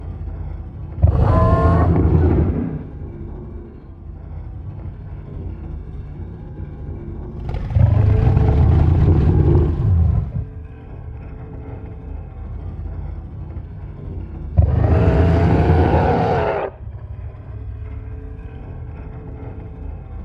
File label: CHARYBDIS Idle Loop